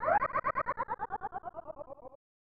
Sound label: Manical Laughter; Clock Tower: The First Fear